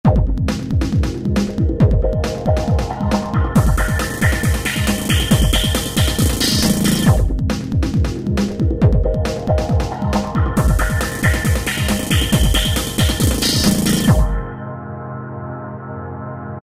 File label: Filter Breakbeats; Korg ES-1; Factory Patterns